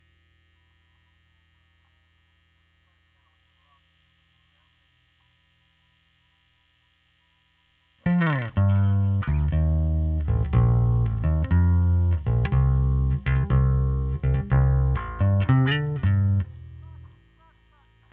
Bass OD

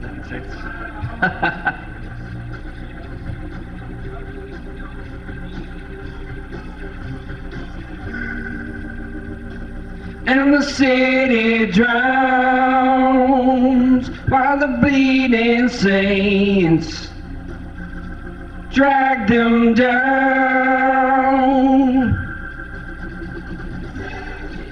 VOX Leslie

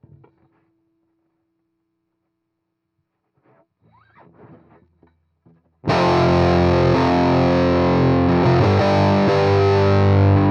Heavy GTR

